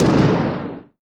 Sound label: T-TOM, PERC; NorthStar - The Wizard VOL-2; TSAR PERC